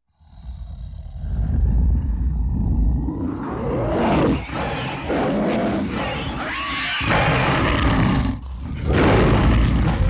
Monster Growl Screams

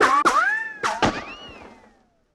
Ricochet & Whiz By Multiple

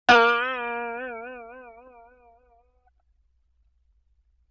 Boing Box High; Vintage Cartoon; Hits & Tones

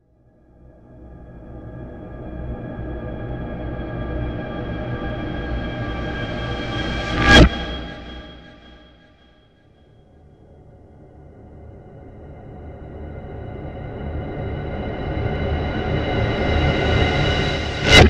Reverse Stinger, Horror; Sound Design

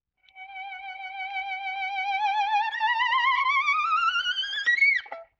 Violin Glissando Slide; Vintage Cartoon; Musical Elements

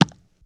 Eye Poke, Hand To Hand Combat

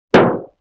Bass Drum Rim Shot; Vintage Cartoon; Drums & Percussion

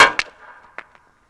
Hockey Puck Goal Post Hit; Sports & Recreation

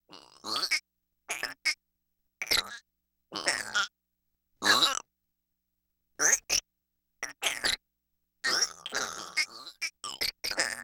Cybertronic Worm, Vocals Humanoid

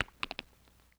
Teeth Clack Soft; Vintage Cartoon; Foley

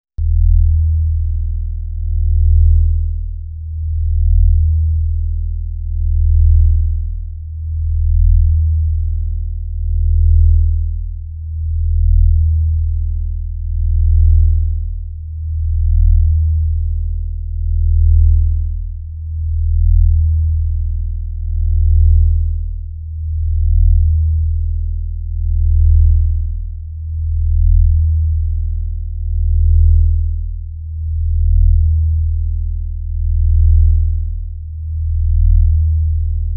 Cyclotronic Throb; Sound Design

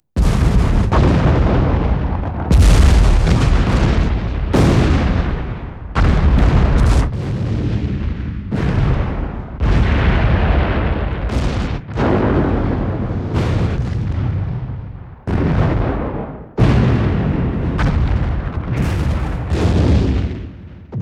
Explosions Large; Weapons & Explosions

